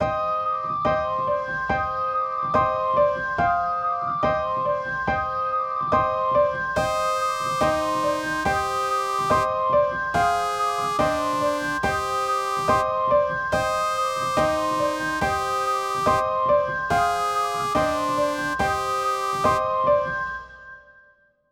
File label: Melody